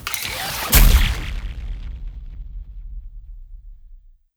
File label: Plasmagun